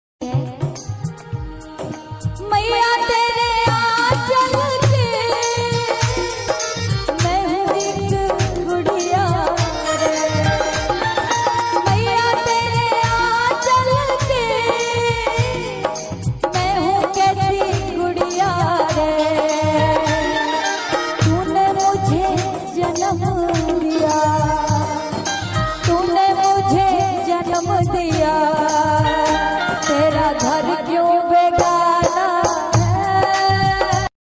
navratri ringtone gujarati ringtone

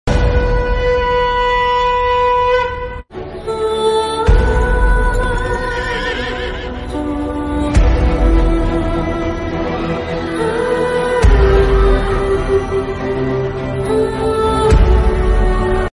manikarnika bgm ringtone

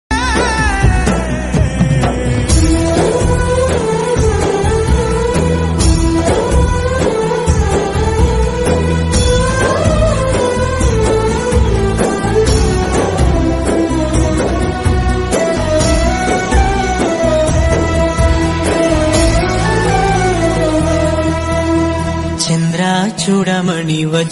kannada ringtone; bharaate movie

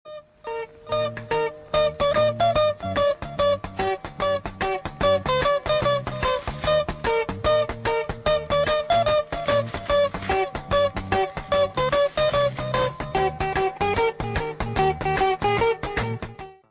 bgm

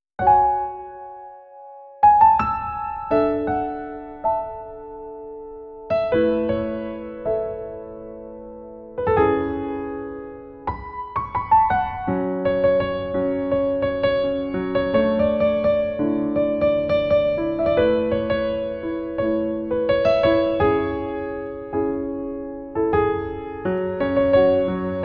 instrumental ringtone, bgm

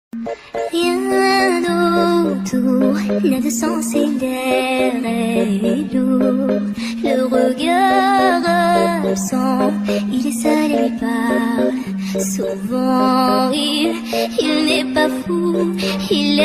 ringtone, english

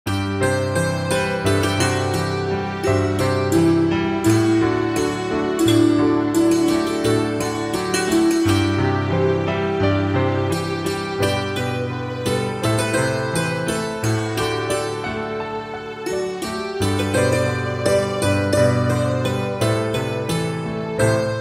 santoor version rabindra sangeet ringtone

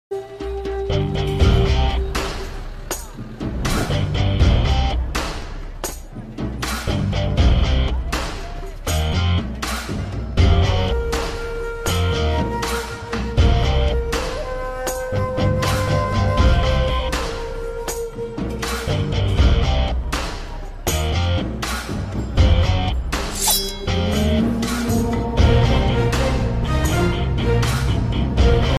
fight scene bgm; paiya; karthi; lingusamy

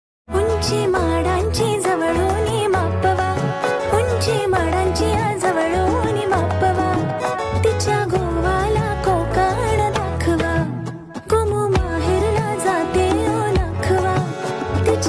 female version

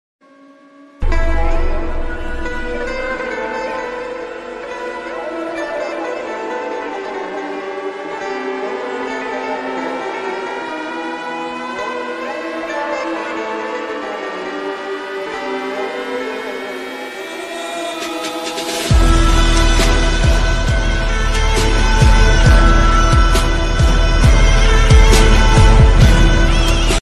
bad background music bgm attitude ringtones

